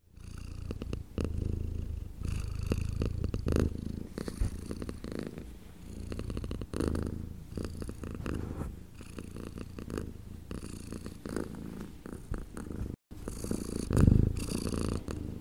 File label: the sound of a cat purring stereo high quality